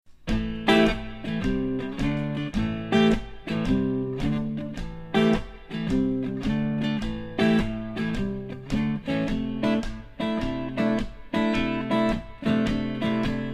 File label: Hooker Blues